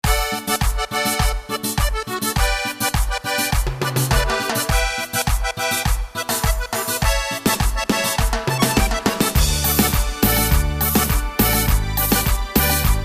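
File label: Cumbia, LatinAmerica; gw-7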